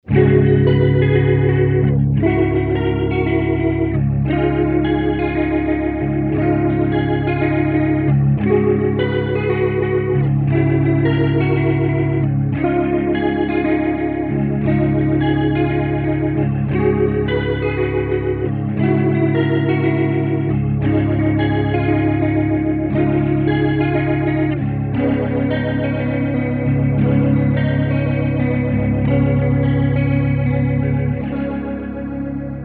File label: rotary; mobius